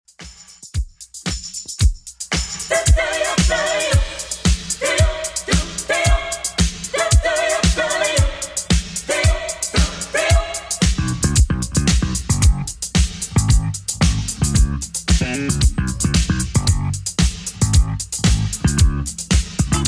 113 bpm; 80s Redrum

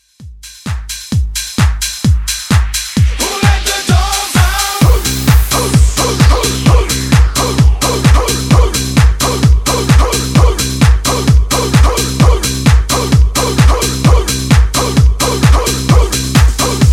Pop Redrum

